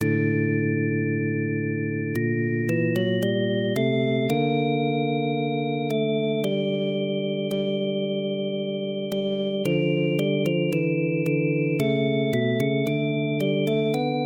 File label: Vibraph Organ; Harmodion; Syntheway